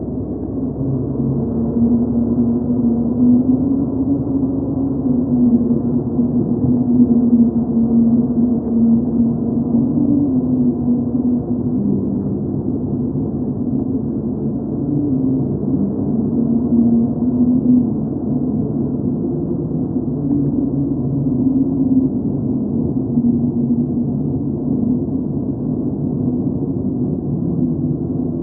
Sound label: melody